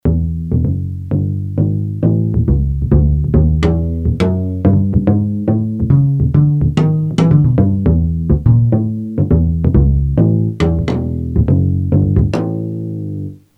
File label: W. Bass, Guitar & Bass, Korg DSS-1